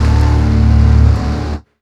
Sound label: Atmoz, Drum & Bass; Total Drum&Bass; 90 s Sample CDs; Hits & Bits